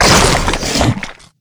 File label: Monster Eat Human; Monster Lizzie